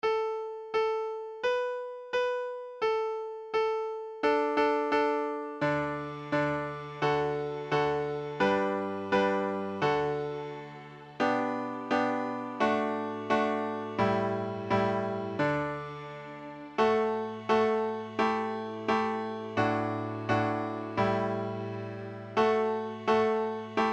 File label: Star Song 86 bpm